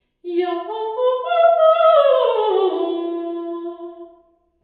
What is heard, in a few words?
bottle dungeon omni sing; falkland palace